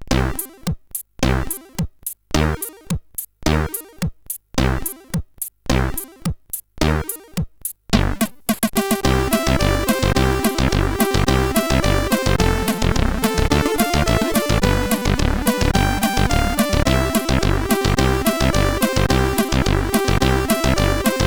Jingle Bells, CSG8580R5; hvsc

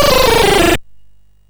sid MOS6581R2; Gryzor; hvsc

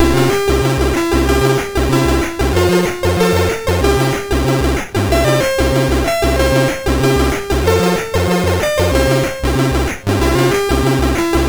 sid MOS6581R2; hvsc

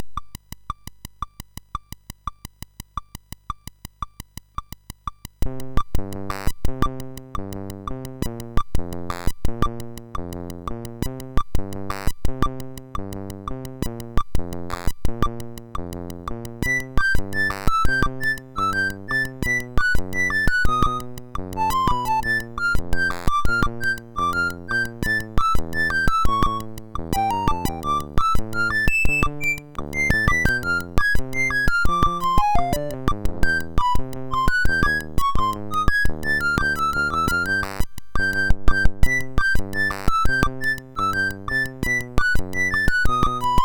Bossa Nova, MOS6581R3; hvsc

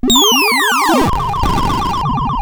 sid, 8580; Die Alien Slime; CSG8580R5

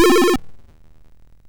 sid MOS6581R2; hvsc

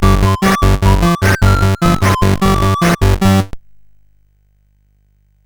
sid CSG8580R5; Starslayer; hvsc

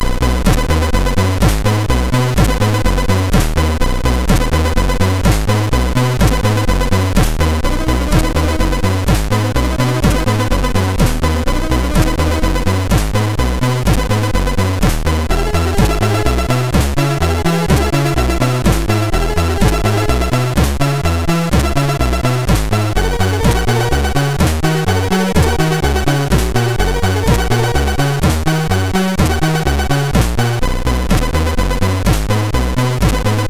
Music, MOS6581R2

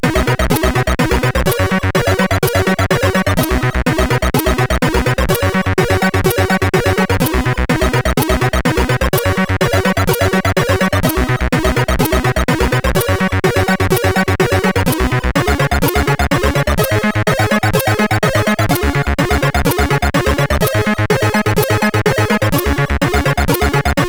sid CSG8580R5; hvsc

